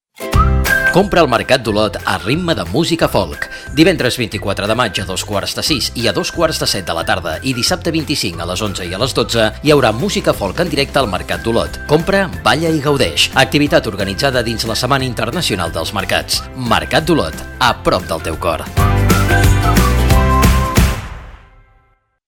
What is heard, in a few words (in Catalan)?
plaça mercat musica